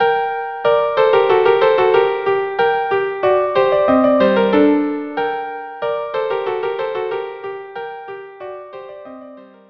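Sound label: violin duo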